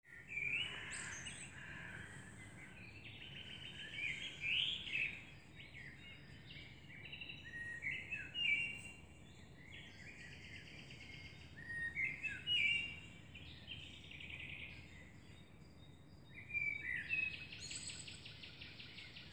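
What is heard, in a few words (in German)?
Dawn Chorus, Vogelgesänge vor Sonnenaufgang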